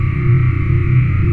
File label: magic loop; weapons